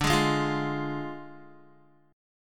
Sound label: D Diminished D, Guitar; strummed